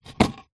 foley concrete block heavy down on concrete ground